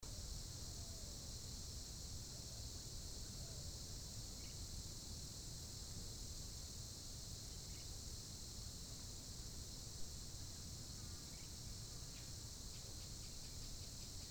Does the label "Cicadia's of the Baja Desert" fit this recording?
Yes